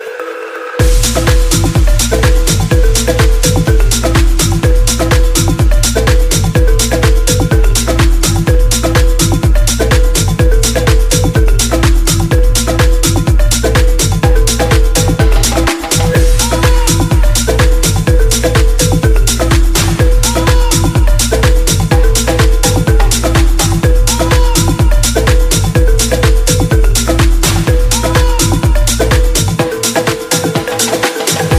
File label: Balafon